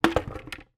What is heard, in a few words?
household bucket plastic place inside another; zapsplat